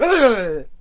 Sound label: Cannon Attack Vocals